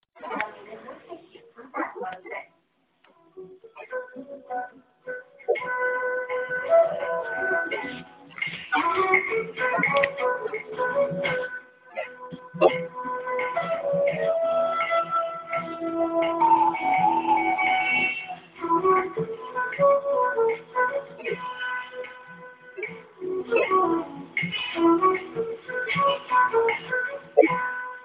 flute

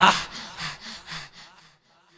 breath happy

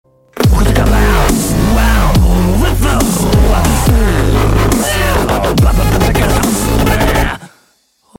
Dubstep

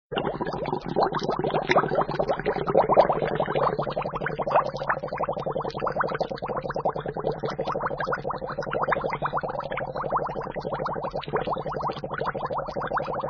buble; hip hop

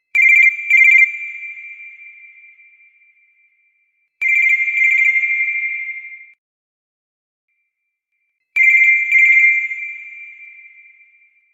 3d cool fox; animals

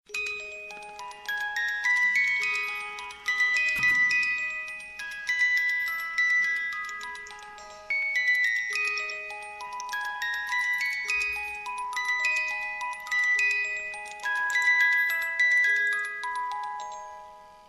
music

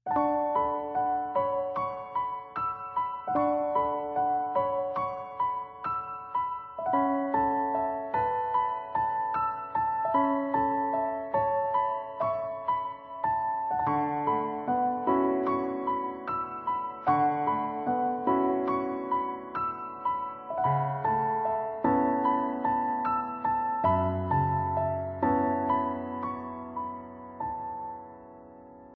Ballad

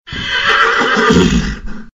horse whinney

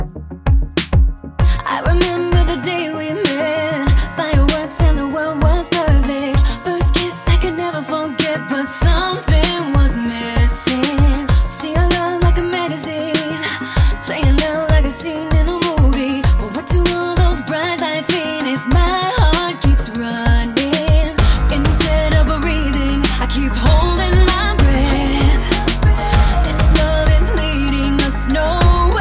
Is Calling Acoustic, ringtones

